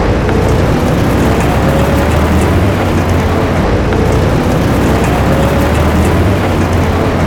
vehicle run; mcheli

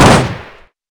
GSh301 fire; mcheli

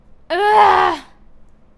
Death, human female evil; customnpcs

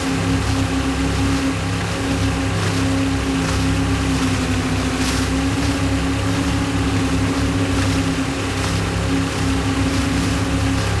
boat; mcheli

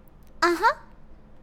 "Uhuh", human girl villager; customnpcs

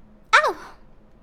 Hurt, human girl heroic; customnpcs

